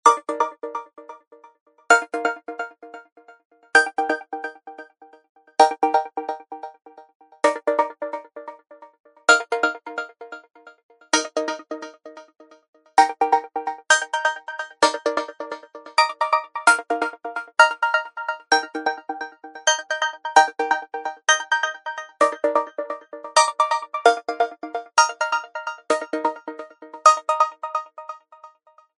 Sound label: Pulser; HTC HD7